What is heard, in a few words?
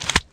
Squish